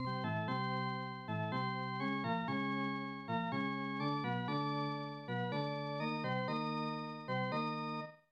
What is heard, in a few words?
Organ Music; NHL Stanley Cup; SNES